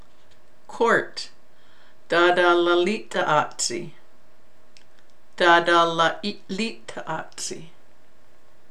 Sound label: Court